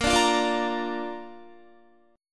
A sharp Major, Guitar; strummed; tuning 4F,4A,5C,5G,5C,6E

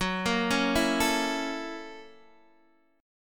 Gb 7th Sharp 9th, Guitar, Open D

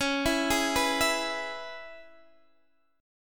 Db Minor 7th Db Guitar Open D

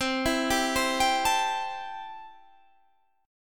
C 6th C, chord

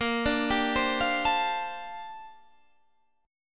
G Minor 11th A sharp

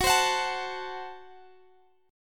Gb Diminished Gb, Guitar Standard; strummed; x,x,16,17,19,17; JGuitar

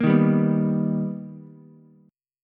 B Augmented Major 7th B Ukulele, chord; B+M7; strummed; JGuitar

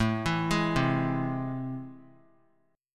A Minor Major 7th, down; arpeggiated; AmM7; JGuitar

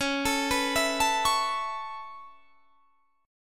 Db Minor 7th Sharp 5th Db down, chord; arpeggiated

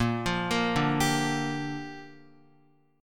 Bb 6th Bb, Guitar; Bb6 arpeggiated

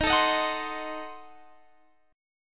Eb Diminished 7th

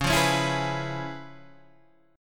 Db 7th Sharp 9th Db, down, Guitar Open G

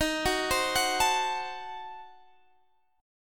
D sharp Diminished 7th D sharp

